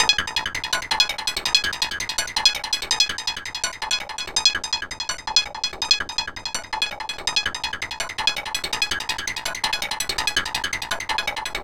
synth arp; Arpeggios; Electrocution

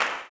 CLAP, Blues Soul; Spectrasonics Vocal Planet

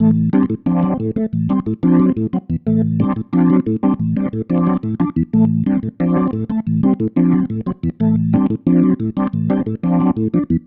Pattern, Organ

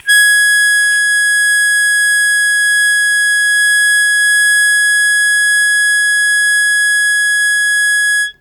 Long Sustain Ab3, Harmonica; Zero G - Harmonica

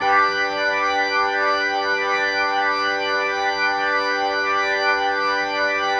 Progression, Organ, Loops; Sonic Foundry (Sony Creative Software); Joe Vitale Organ Donor; Progressions

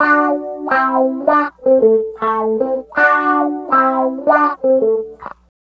Guitar Loop; Ambient Grooves

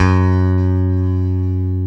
ROCK BS F#3, PICK BASS

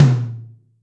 Amb Tom L, Acoustic Tom; Yamaha DTXpress

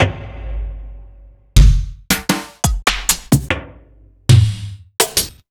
Akai MPC500 Garage Kit

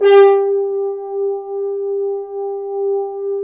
BRS, FHns Accent; Roland L-CD702; Accent-Swell

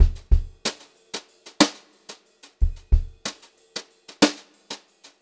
BEAT, Brushed Drum Loops

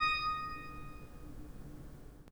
PIPE, 3DOrgans

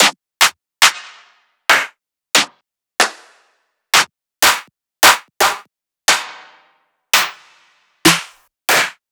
One Shots Claps

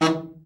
BARI, Baritone sax; Best Service; Killer Horns

